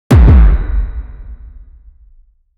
punch sound effect; close combat

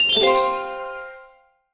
victory

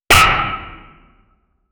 create sound of a punch, armor

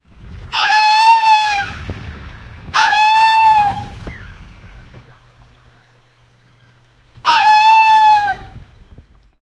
jeep engin sound; automobile

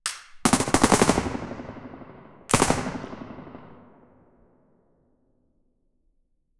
single sp5a3 9mm gunshot

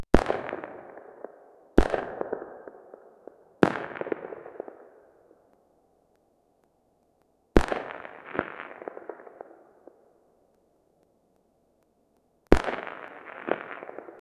a gangster type sound

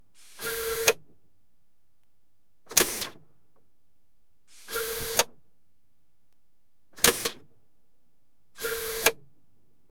pressurized airlock opens

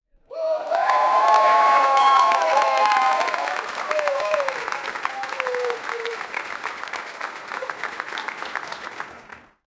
studio audience erupts in, applause

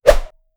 action

